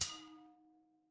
impact metal hit

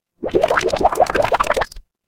water bubble

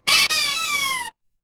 cartoon squeak

